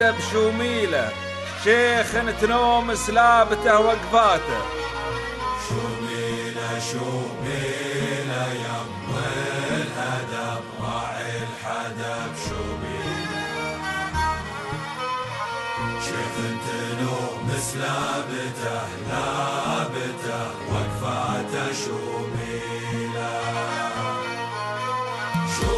qatari song

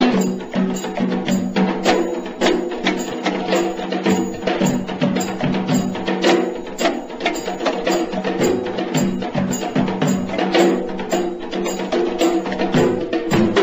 sri lankan traditional ringtone

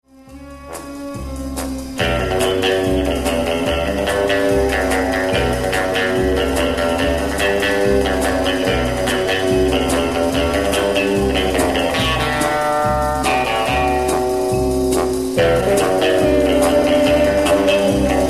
original theme, ringtone; 007 james bond

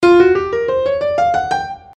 jazzy

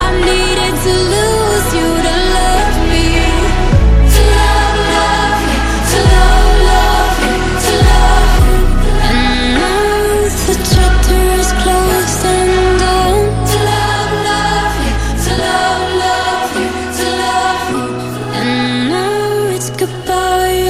hollywood singer ringtone

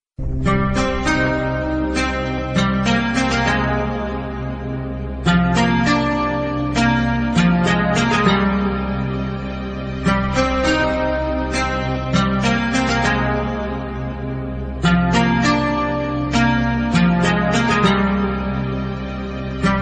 guitar; zee plus

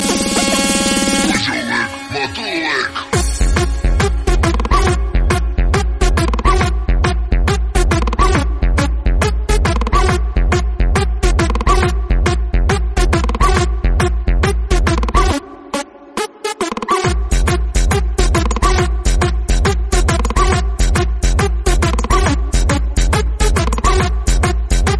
clubbasse

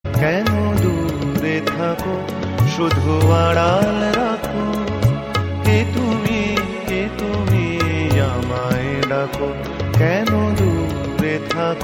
bengali adhunik ringtone

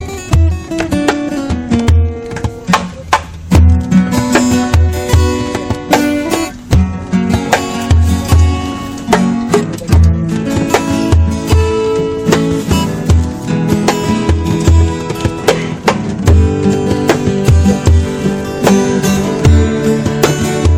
sri lankan guitar

